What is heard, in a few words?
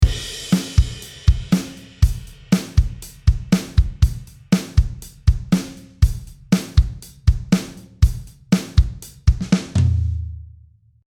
Drums solo